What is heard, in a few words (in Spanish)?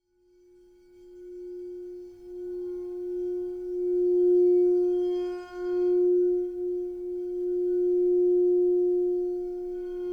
BOWL FA; sonidos de cuarzo; escucha y armoniza los chakras